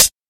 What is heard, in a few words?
00s Thin Pedal Hi-Hat Single Hit D#